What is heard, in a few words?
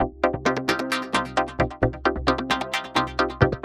NRG Arp, trance